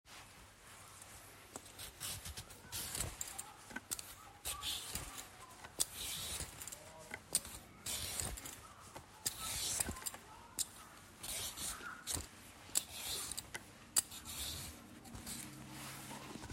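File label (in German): Möhren schälen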